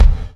GATE BD; USB Soundscan vol.10; AKAI; 90s sample CD; GATEKIT 1

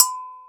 Gankokwe Hi Op; Multisamples; Digital Sound Factory Planet Earth

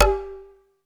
PERC G3; SEQ AND PERC; Music Store - Claudius Diemeter Schwerlos

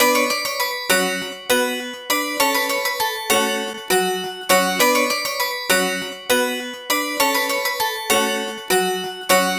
Harpsi Music Box; Sonic Foundry (Sony Creative Software); Club Joints and Street Anthems; Camalot

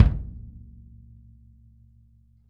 kick, Drum Kits

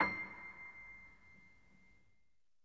acoustic piano, stereo; InVision Interactive; Miroslav Vitous

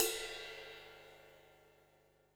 RIDE, Drums Acoustic

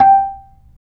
B-3 Percussion; Roland; Keyboards of The 60's and 70's

